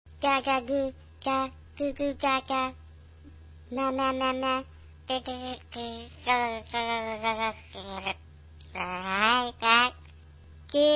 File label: baby sounds